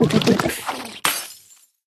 Nitro Drink; Hero Tramin

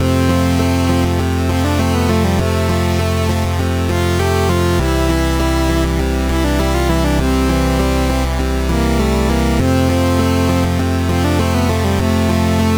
Farm Loop

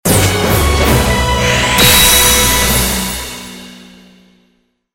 rank up

